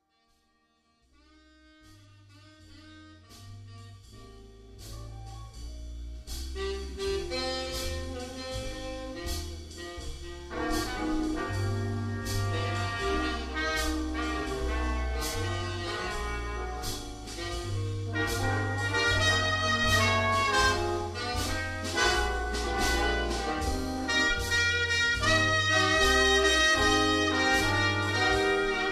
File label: Swing Jazz Combo; Premier